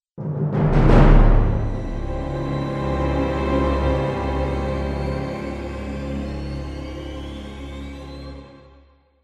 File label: Stinger Crash; Airfield